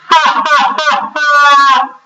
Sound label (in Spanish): risa